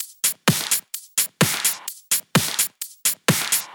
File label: Drumloops 128BPM